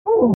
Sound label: Vox Filtered Hurt; Mom